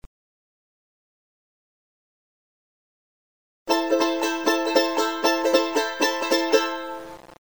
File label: potosi normal, charango; folklore